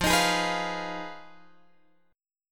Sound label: F Minor 7th Flat 5th, Guitar, Open F; Fm7b5; strummed; x,12,15,15,14,12